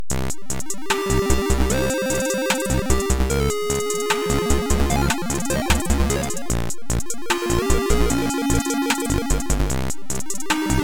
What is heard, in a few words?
Digidrums 50Hz 75bpm; Bonus Mirage